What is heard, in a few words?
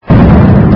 Burst